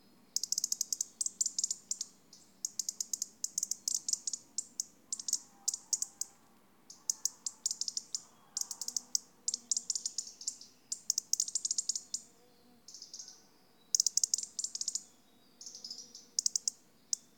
Erithacus rubecula; SETE

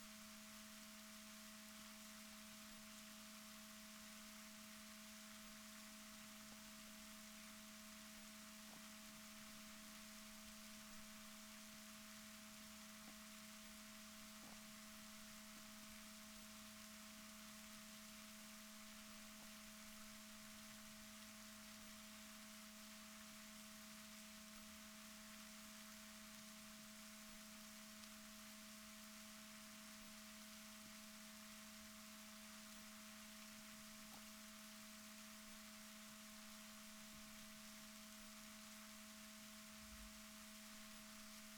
rorquals positif, Balaenoptera Physalus Chile

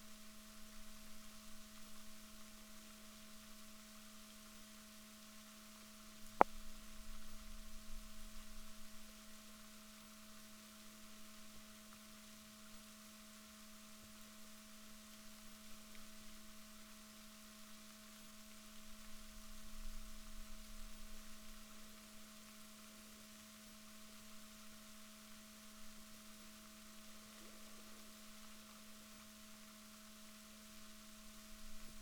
rorquals negatif, Balaenoptera Physalus Chile

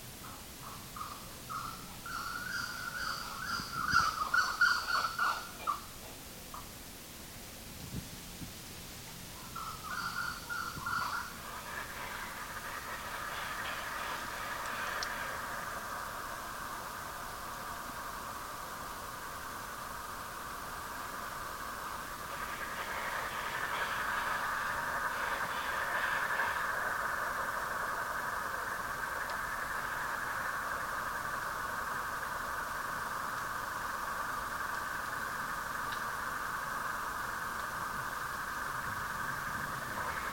bird

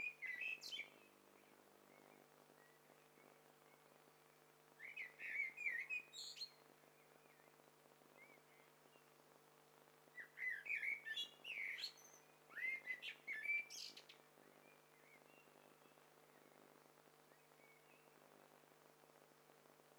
STEREO BIRD COCKTAIL PARTY

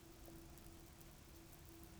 pulses, BALAENOPTERA; MONACO 2022-07

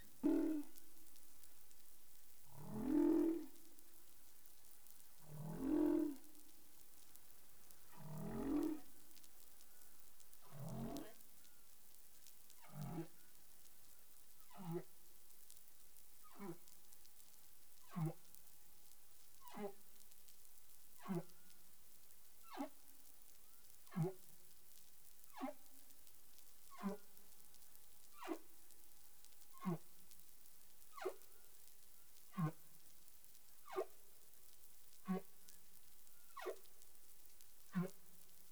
humpback whale; CARIMAM

